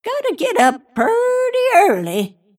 deny pain; snapfire

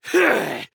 Grunt Physical; Realm Royale; Warrior; Lord Of Darkness

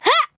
Grunt Jump; Paladins; Rider

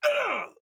Grunt Hit; Paladins; Androxus

